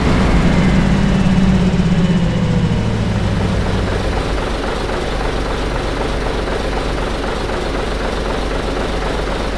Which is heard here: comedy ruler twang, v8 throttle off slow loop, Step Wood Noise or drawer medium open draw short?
v8 throttle off slow loop